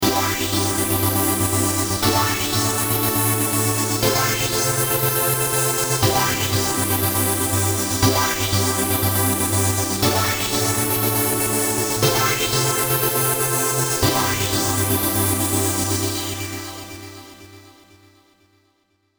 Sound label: trancegate stereo